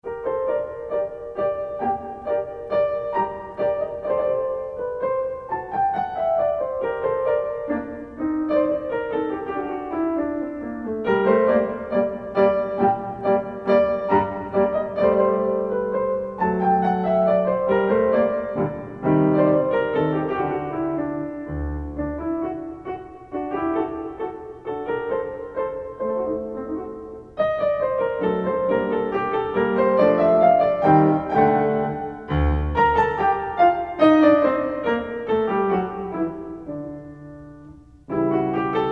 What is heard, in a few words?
Minuet in G minor; Marlais Book 4